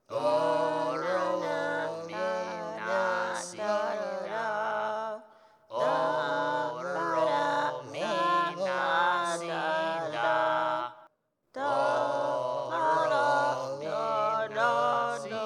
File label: snails deep melody teach together